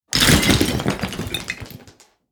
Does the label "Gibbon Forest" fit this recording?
No